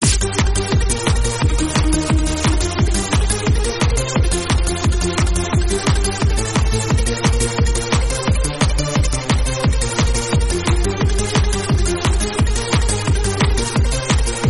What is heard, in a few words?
remix